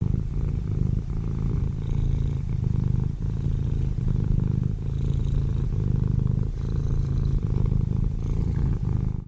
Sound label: purring cat